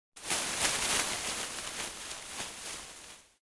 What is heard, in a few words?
grass